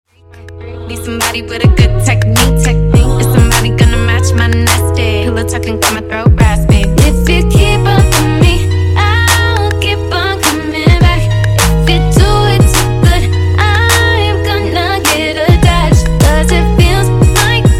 Funk Intro, 104Bpm

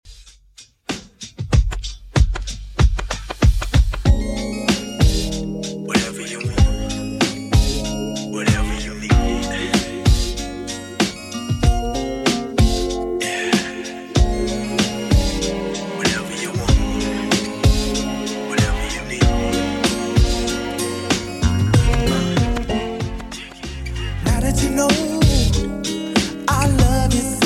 90s Redrum 95bpm

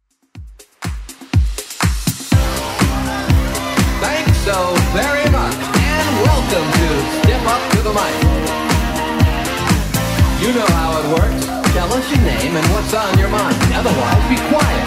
80s Club Edit 122bpm

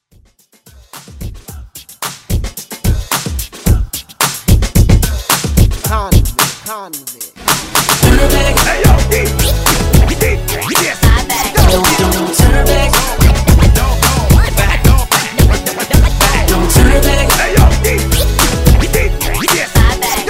Throwback Redrum

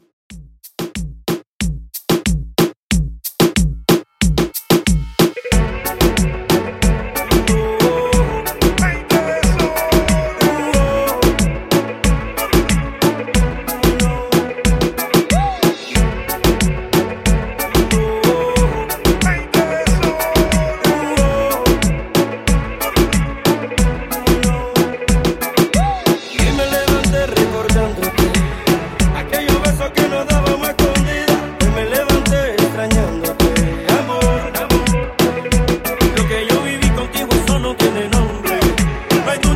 In Outro Reggaeton 92Bpm

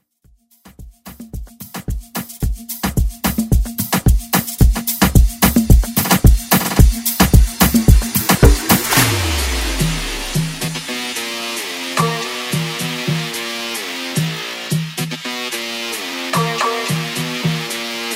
Moombah Redrum

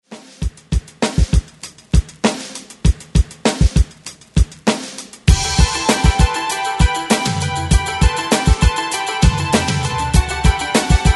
80s Re Drum 98 Bpm; DJDOLLS

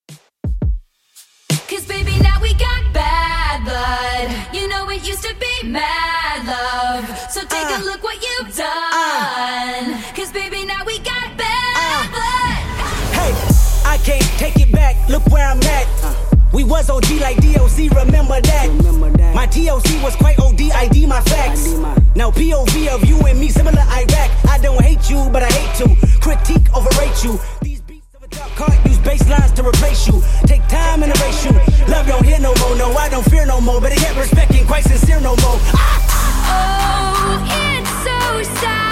85bpm

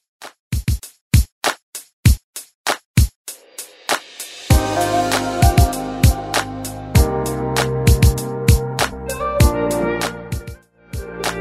Top40 Redrum 98bpm

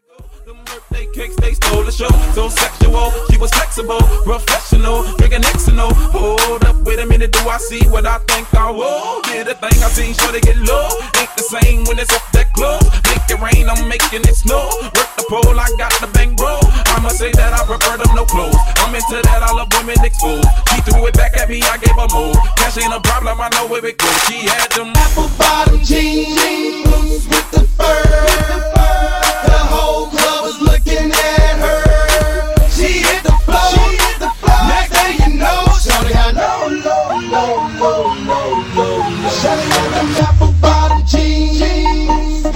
Transition And Dj Tools 126Bpm

